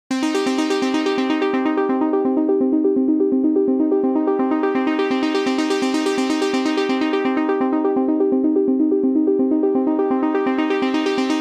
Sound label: Subsynth lfo mod